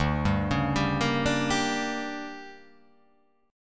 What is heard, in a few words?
D Major 7th Suspended 4th Sharp 5th